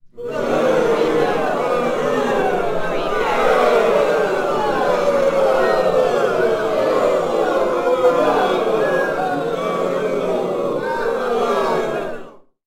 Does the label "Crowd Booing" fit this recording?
Yes